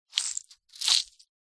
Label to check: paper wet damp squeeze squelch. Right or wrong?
Right